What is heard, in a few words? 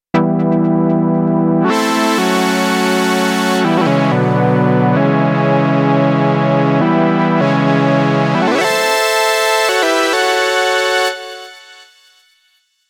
Po Dance Chord; KOPRO+